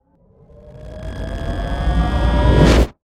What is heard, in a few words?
Laser Arm Charge